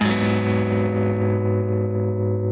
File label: G#m7 Arpeggio down